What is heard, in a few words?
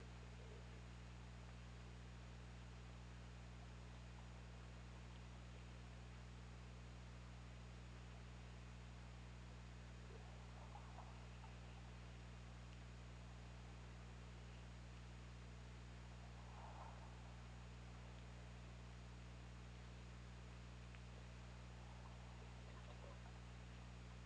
Orca; OrcaLab